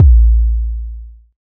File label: verby bass drum sound a sharp key; kick drum samples